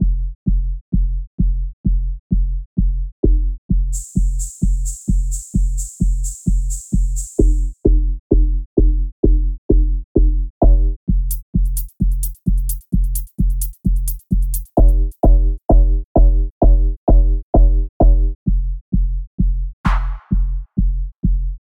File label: percussion, synth